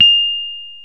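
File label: piano Rhodes Mk.V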